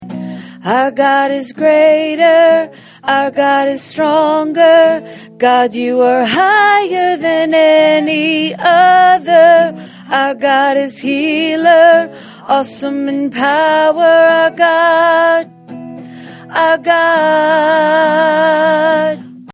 Alto

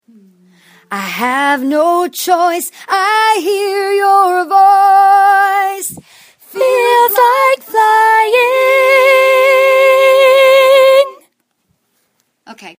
Verse 3rd above

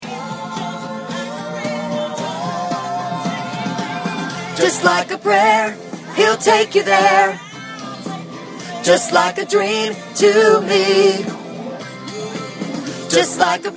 Lead

